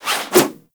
Zipline Brake Foley; Gadgets; Parkour; Warframe

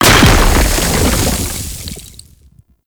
Octopede Rock Impact; Warframe; Man In The Wall

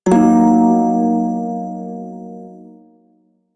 Prime Shawzin Blues Chord G; Music; Warframe

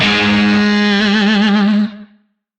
Shawzin G Sharp; Oct One; Music; DE; Warframe; Lizzie Shawzin

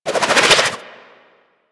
SMG Alt Projectile Deploy; Weapons; Warframe; Tenno; Sundial

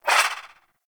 Swing, Melee; Balance Four; Warframe; Weapons; Modular Melee

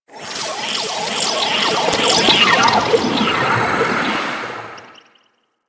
Excavation Scanner Random; Missions

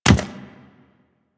Chimera Step Default; Warframe; Ballas Chimera; Steps

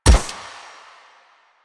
Shot Mech; Warframe; Akarius Prime

